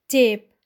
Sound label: tape; grade3; prathamik upachar